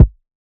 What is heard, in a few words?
Mixed Kick